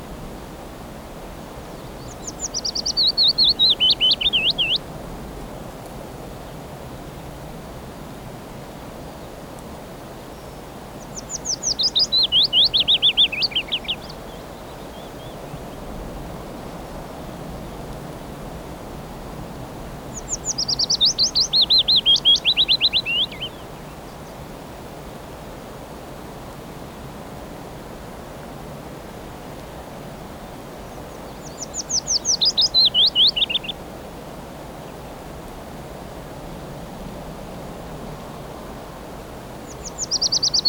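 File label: spring song of willow warbler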